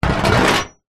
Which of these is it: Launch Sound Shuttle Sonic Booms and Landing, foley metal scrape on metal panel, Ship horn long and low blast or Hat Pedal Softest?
foley metal scrape on metal panel